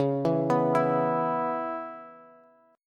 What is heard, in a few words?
Db Minor 7th Db down Bass, Dbm7 chord; arpeggiated; JGuitar